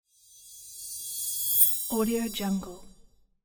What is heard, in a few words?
shining transition